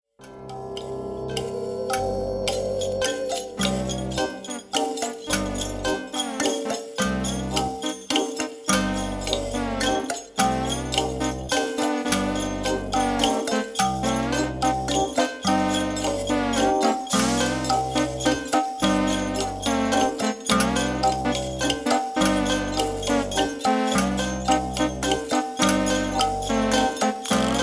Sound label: waltz